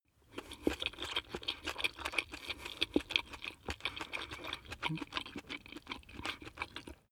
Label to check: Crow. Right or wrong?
Wrong